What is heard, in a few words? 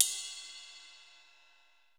JAZZ CY RD, JAZZ KITS; Crypton; DrumBase5000